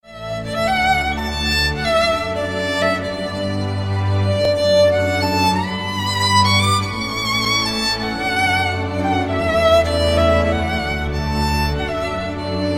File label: Violin; Lotr Medley